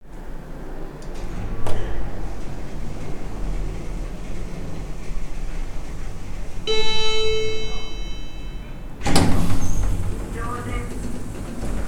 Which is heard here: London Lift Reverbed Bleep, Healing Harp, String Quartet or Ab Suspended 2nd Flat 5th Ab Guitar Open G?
London Lift Reverbed Bleep